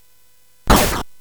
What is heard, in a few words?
Dark Castle, CSG8580R5